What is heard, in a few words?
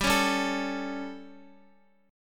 G Suspended 2nd Flat 5th G, Guitar, 4F, 4A, 5C, 5G, 5C, 6E; strummed; x,x,7,6,7,5